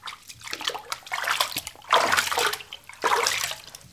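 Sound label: Close Water Splashes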